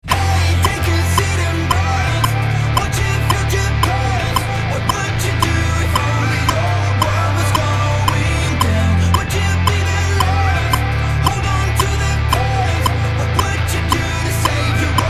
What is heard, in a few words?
drum playalong snippet